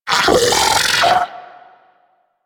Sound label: Arachnoid Vocal Player Spotted; Warframe; Corpus; Arachnoid Hunger